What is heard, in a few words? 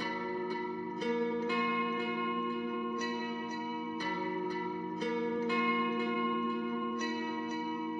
zither notes; ゆめ2っき